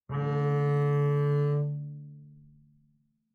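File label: Bass arco fortissimo sul D D2, Double Bass; MIS Pitches - 2014; Strings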